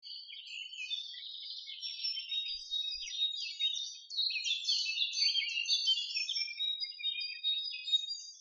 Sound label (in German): vogelgezwitscher singvoegel